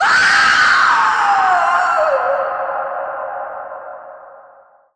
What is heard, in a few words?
Scream